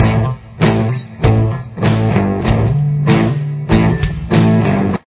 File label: backing